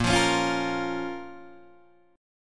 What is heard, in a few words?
A sharp Minor A sharp down Guitar DADGAD